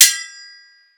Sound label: sword